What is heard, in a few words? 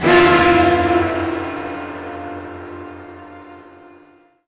String Medium